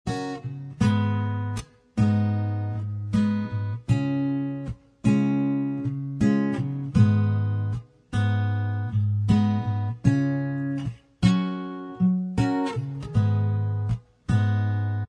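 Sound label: acoustic instrumental track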